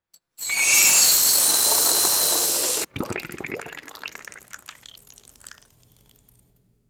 intense industrial crucible quenching in liquid; machines

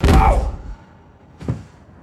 heavy muffled body drop on carpet, fight

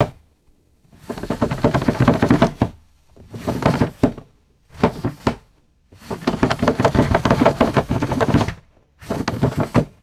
violent shaking and rattling of a wooden drawer; drawers

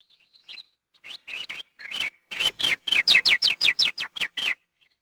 active northern mockingbird calls and chirps; birds

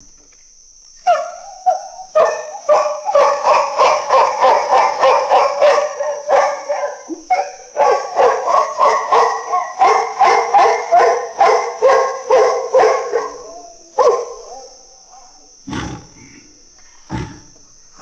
deep howler monkey barks and grunts; animals

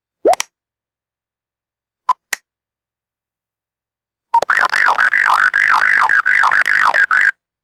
retro cartoon click echo repeater

